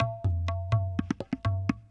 PERC LOOP ETHNO 125

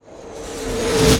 Explosion-Lead In; 1944 Battle Of The Bulge

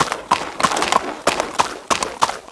goat walk; Empire Earth

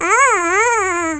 dizzy; lomax

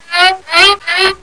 GOOD violin

